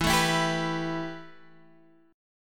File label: A 7th Suspended 2nd E